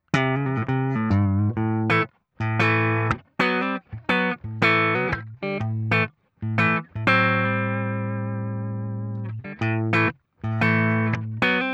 Soundvergleich Neck Pickup Ibanez RG631

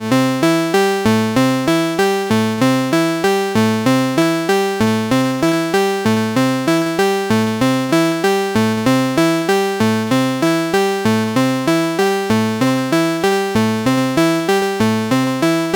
Coarse Stereo Robot LFO XOR Tri Feedback 50 Prozent; Bastl Thyme

